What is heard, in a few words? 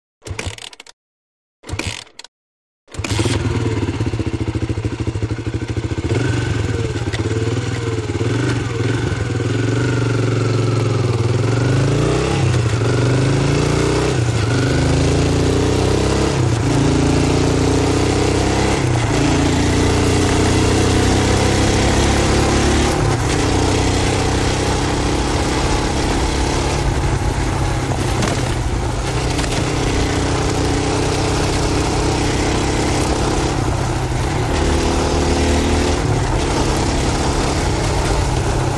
Motorcycle; Constant; Honda Trials Bike Start Up And Away Riding On, Finally Slows, Stops And Switches Off.